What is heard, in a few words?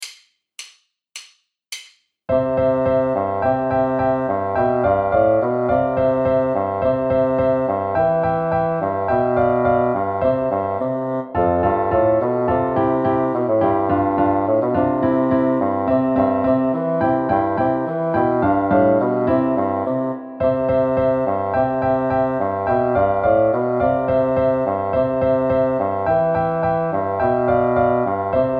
czech dance basson